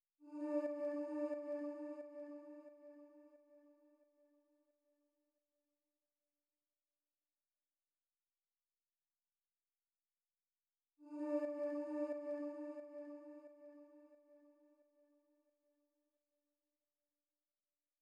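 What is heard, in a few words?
89 BPM D Minor Accent, Latin; Melodies; Loop Stems; Blanco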